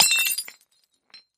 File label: Kitchen Glass, kitchenware